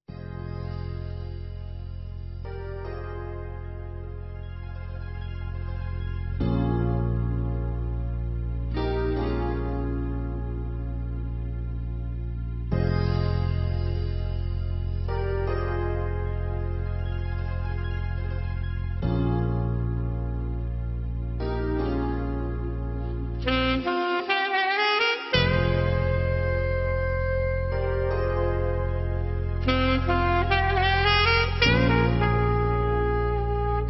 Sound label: soft sax